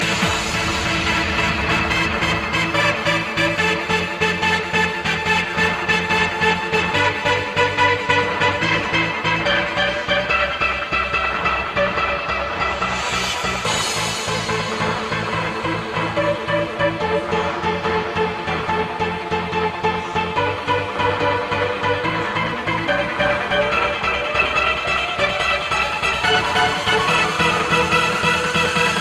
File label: Loop